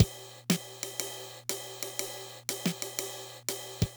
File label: Loop, dd-5